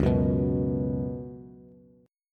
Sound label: Db 7th Suspended 4th Db down Bass; JGuitar